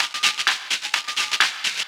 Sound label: FX Loop 128 BPM, House